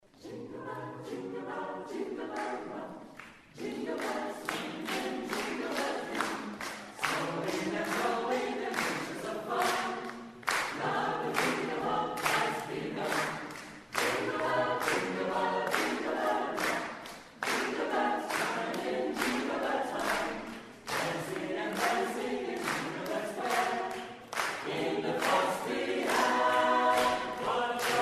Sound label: concert recording